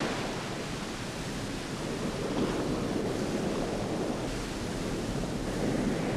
Surf Sizzle Loop, waves surf

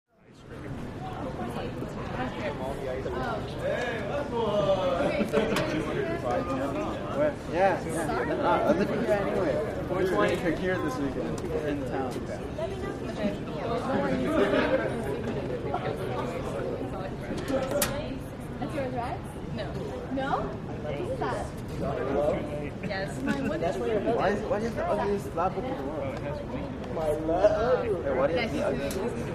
School Walla High Sc, crowds ext

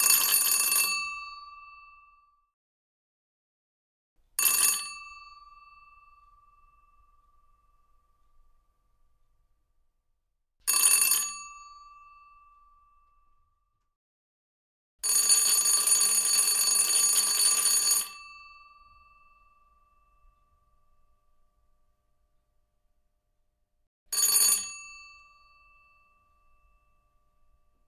Door Bell Ring Interior

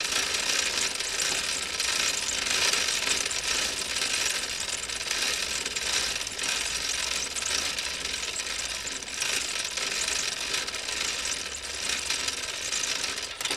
Bike Pedal Slw Gear

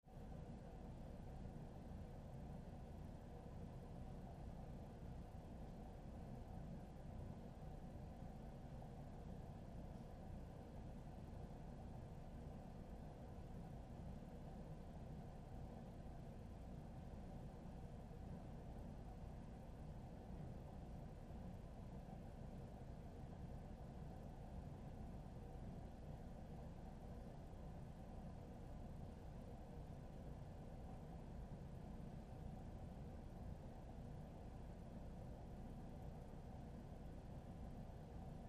Lab Room Tone Quiet, hospital

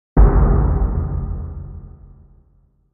Impact Deep Metallic

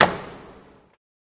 Bed Motor Elect End; latches & bolts

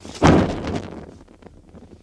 Flame Burst Fade

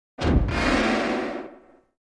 Synth Door Opens Sque

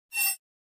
Alert Beep Sci Fi

